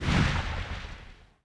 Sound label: FIREBALL COLLIDE